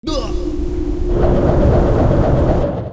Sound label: mob die, Monster; Bossmob; 금전방; 금적산; 2단계